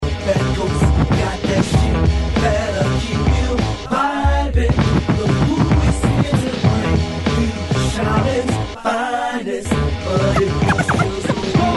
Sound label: street inst accapella